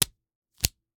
Slap bracelet, smack, slap, whip, felt, plastic, hit, hold, continue; Eiravaein Works; Vaeyan IV